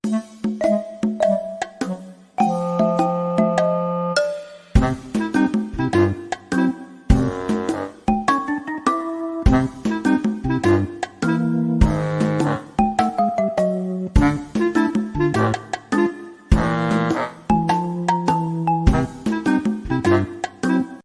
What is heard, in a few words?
Cartoon Ringtone